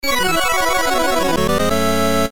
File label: power up